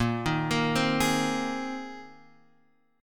Bb 7th Suspended 2nd Suspended 4th Bb, Guitar Half Step Down